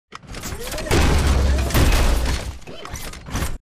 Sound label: melee stomps backward; Titanfall 2